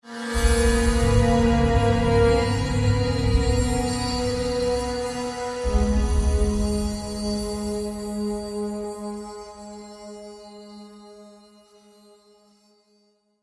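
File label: Combat Stinger; State of Decay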